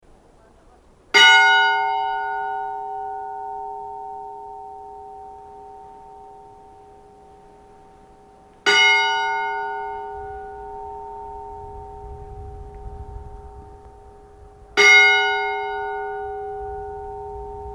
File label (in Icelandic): grundarkirkja likhringing; Grundarkirkja var byggð árið 1904; eyjafjardar og thingeyrarprofastsdaemi; Kirkjan er einkar stór og glæsileg